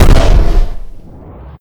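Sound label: railgun fire, weapons; q3rally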